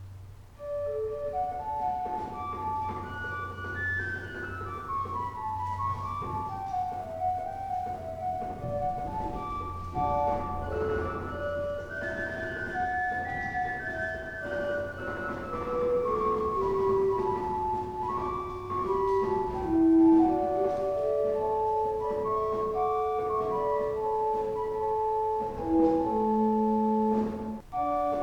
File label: Flaute 4; Spring City